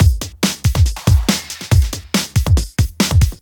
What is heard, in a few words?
Breakbeat 140 BPM